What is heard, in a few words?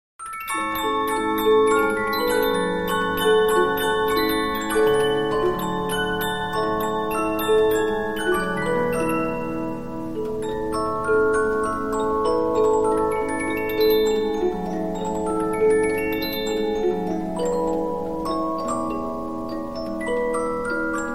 Polyphon, 15inch disc musicbox